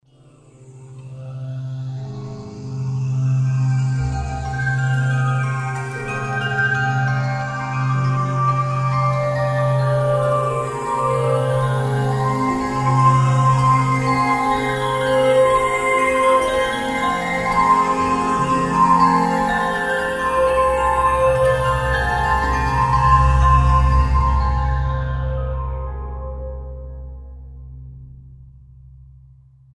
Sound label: Phantom Pad, Roland SR-JV80-14 World Collection Asia